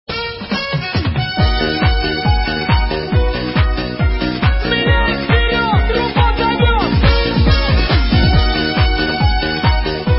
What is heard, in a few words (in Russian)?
truba; Русские